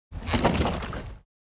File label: Motorcycle shaking the bike pushing the suspension; vehicle